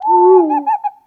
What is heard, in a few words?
Enter Play